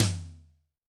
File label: Tom, Yamaha DTXpress II